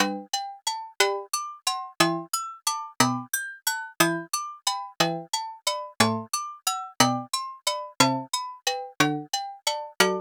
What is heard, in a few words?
agogo bach